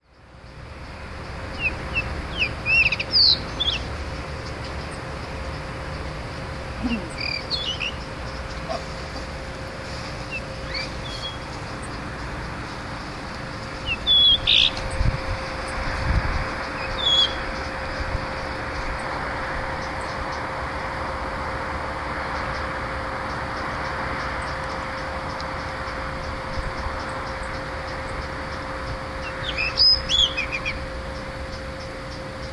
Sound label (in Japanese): isohiyodori